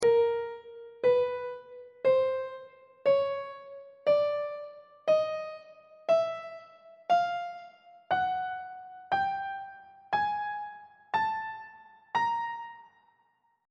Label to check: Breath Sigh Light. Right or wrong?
Wrong